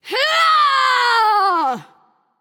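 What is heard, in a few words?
battlecry, female; smite; voicepack; hera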